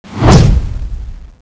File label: attack, giant; dione